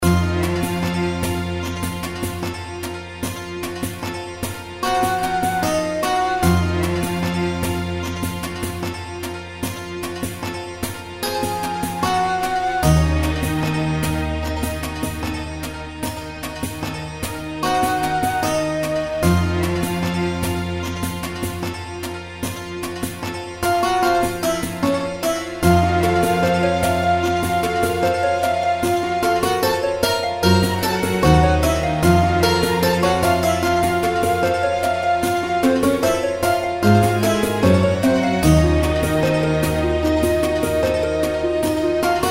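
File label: reel spin background, egyptian empress; games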